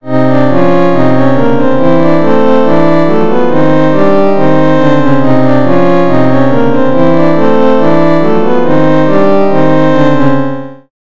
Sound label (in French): musique bizarre, electro